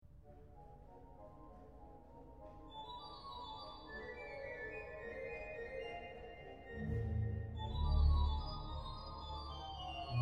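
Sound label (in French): verset, orgue; Improvisation verset n°8; buffet d'orgue de la cathédrale de SAINT-DENIS Cavaillé-Coll, 1841; organiste: Pierre PINCEMAILLE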